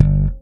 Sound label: SLP POP G, PEDULLA BASS; Masterbits CLIMAX 7 - Bass